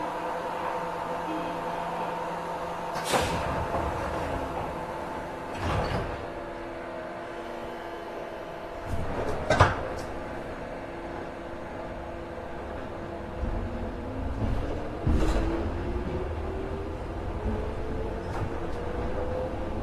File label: keiyo 103, train